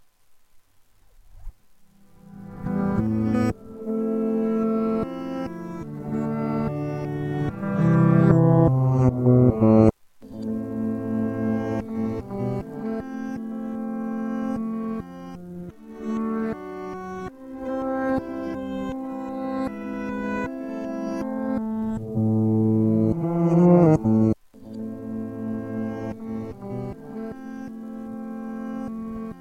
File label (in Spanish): revertir, guitarra; darle la vuelta a las ondas; Obtenemos un sonido irreconocible